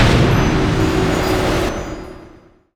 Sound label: mana leak target; weapons; dota; keeper